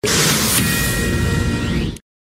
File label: news alert